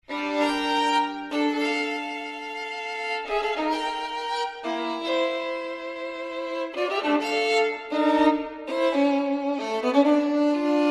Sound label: Sonata for solo violin, Tempo di Ciaccona